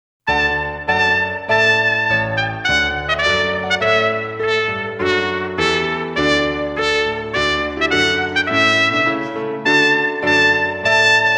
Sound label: Trumpet Tune (with piano), Trumpet; trumpet piano organ harp; Lyrica Demo